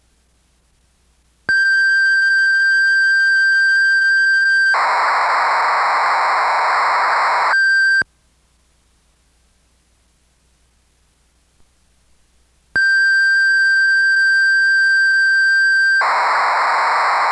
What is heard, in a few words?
Korg poly61